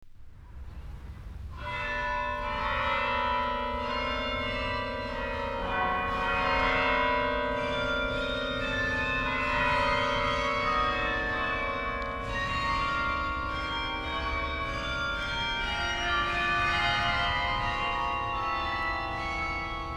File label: Music for Carillon; EMI Electrola